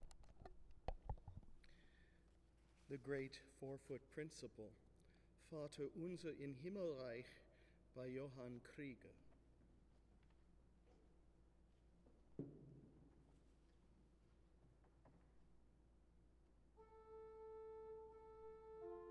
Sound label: Gt 4 Principal; Vater Unser